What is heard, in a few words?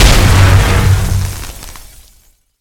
hit; smite; chaac